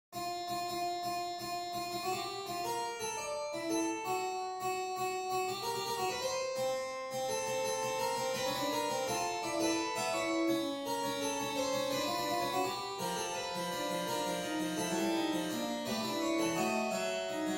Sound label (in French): fugue en fa pour clavecin